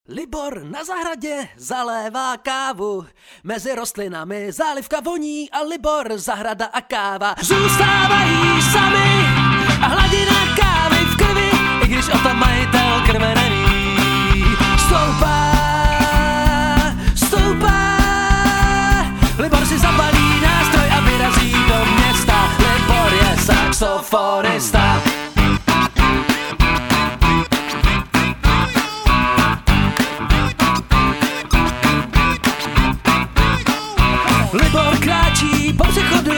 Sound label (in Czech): saxofonista